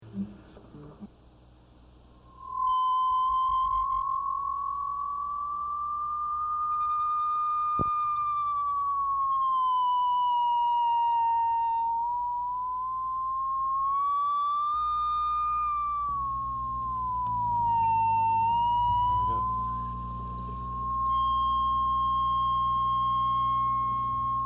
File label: Jingle; Writing Center Jingle